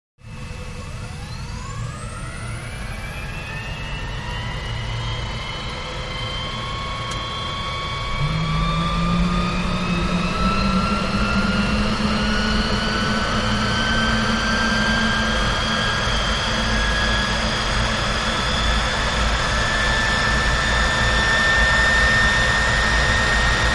Gnat: Start / Idle Interior; Quick Start With Rushing Air Of Engines Highlighted. Close Perspective. Jet.